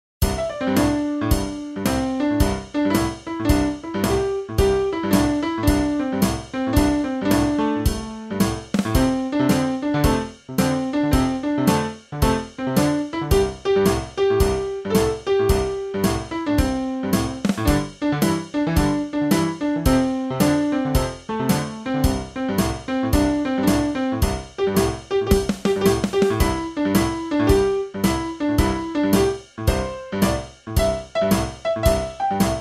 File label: mini blues, ジャズ